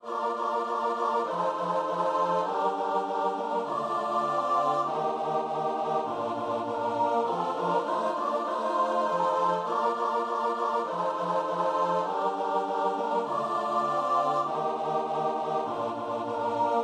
old st nic, choir

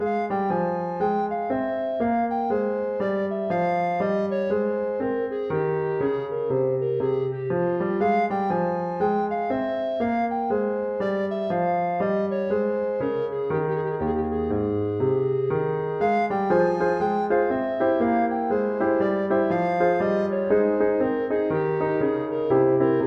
bach bouree alto recorder

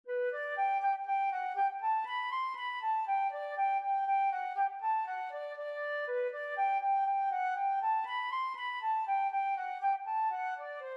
flute

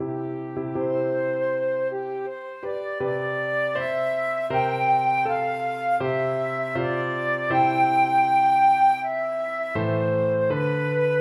flute

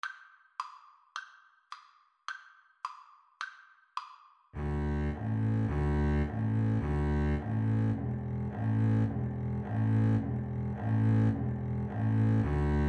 Double Bass Duet

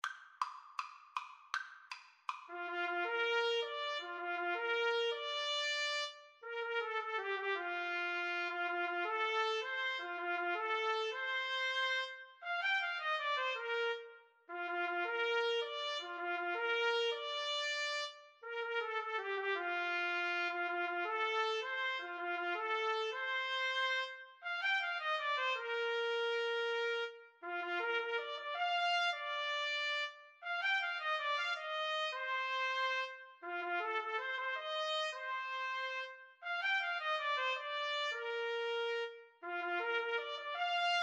trumpet duet

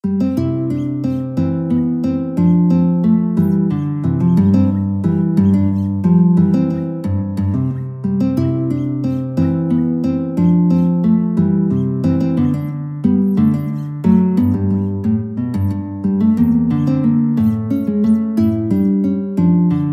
guitar

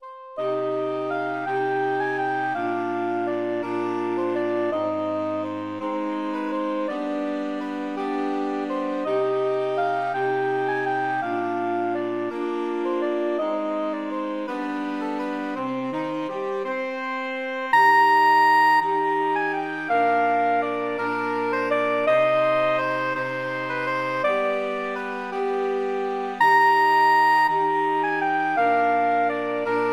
saxophone quartet

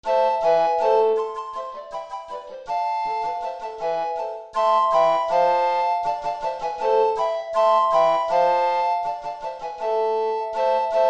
recorder quartet

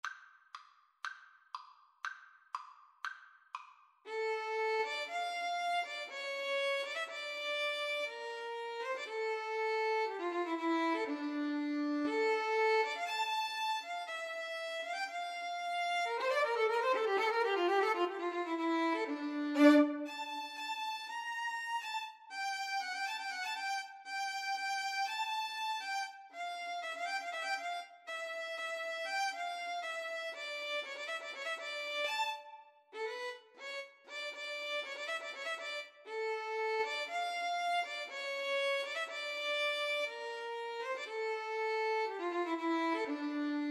Violin Bass Duet; brahms hungarian dance 5

